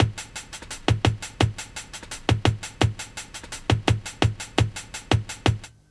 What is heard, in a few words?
LOOP, Jungle